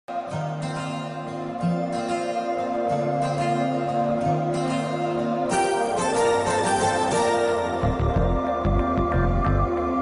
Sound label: song bgm; parugu movie